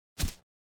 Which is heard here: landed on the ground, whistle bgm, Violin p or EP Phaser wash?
landed on the ground